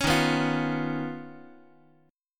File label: D sharp 7th Suspended 2nd Sharp 5th, Guitar; strummed; JGuitar